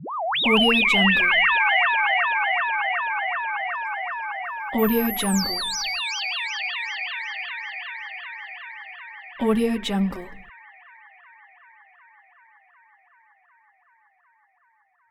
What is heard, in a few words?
space station signal